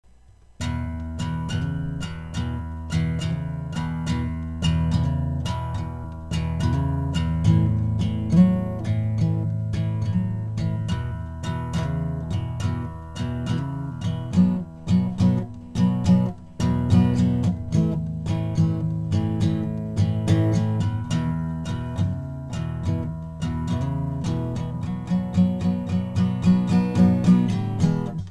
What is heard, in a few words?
12-12 bars